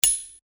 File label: Sword Sound; FXHome; Fighting Sounds